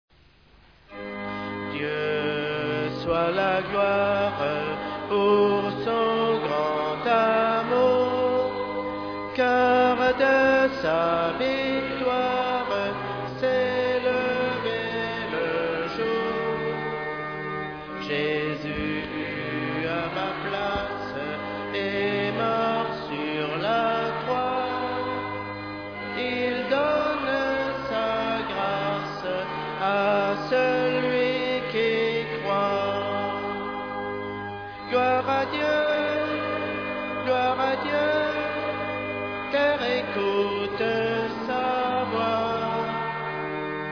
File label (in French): Chant; Greffern; Culte